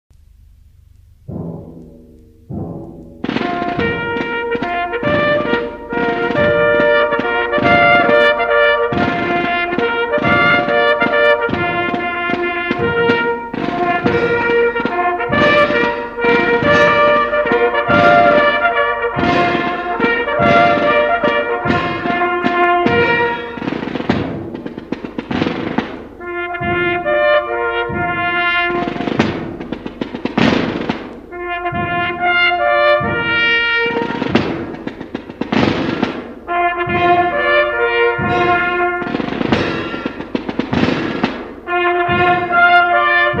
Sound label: Band